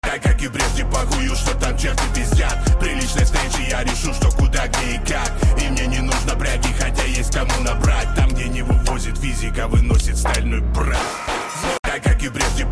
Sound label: blatnoi rap